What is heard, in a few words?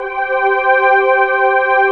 HOUCEY CHORD